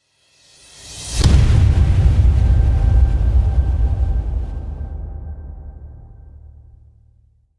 booms nuke with whoosh

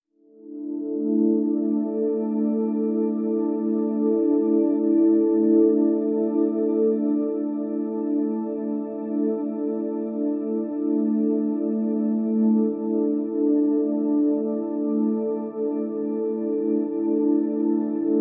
pads d

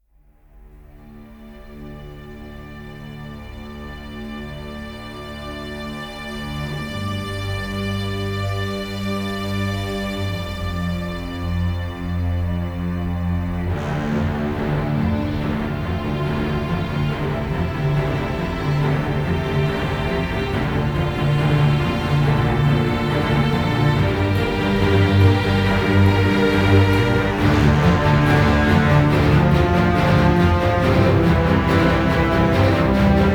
no percussion

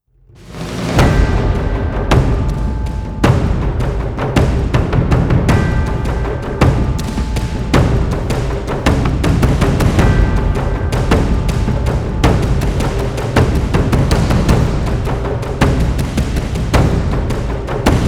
drums and fx

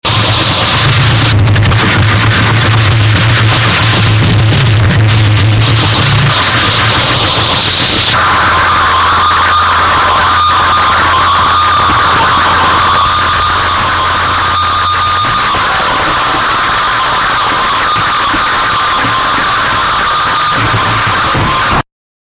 fanfare

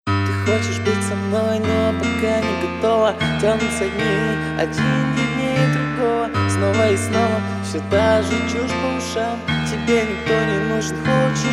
acoustic

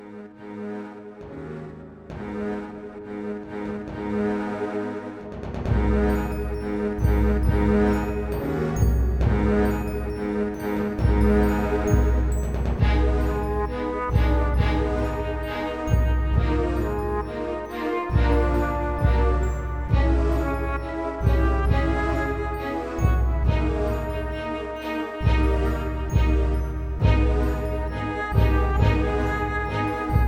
theme flute

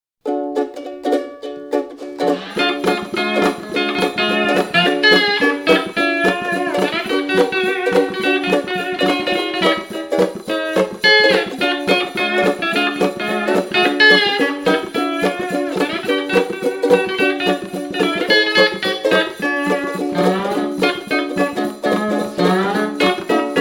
jingle bells

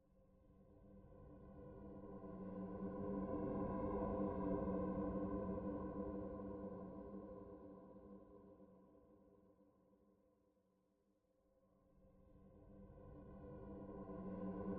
horror ambience

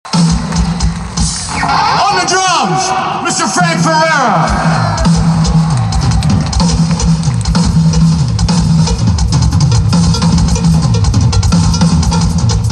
instrumental solo

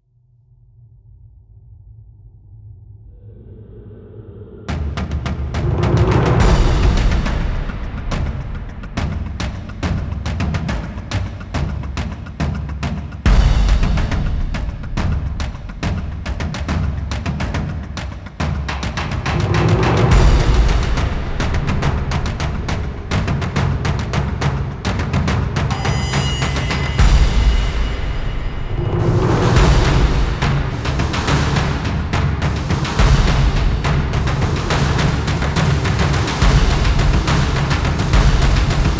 drums fx

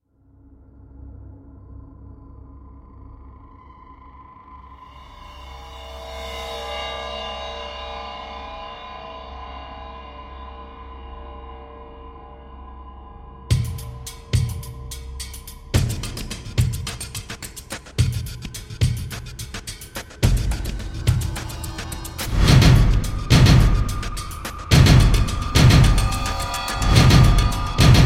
drums fx

